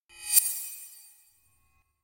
Whoosh Metallic Reversed Thin Attention Grabber - Very High; Hollywood Edge; sound designer's toolkit v2